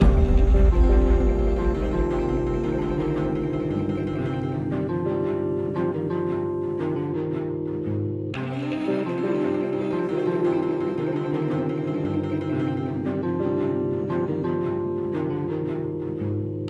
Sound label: Tension Loop 57.5bpm 4bar; project zomboid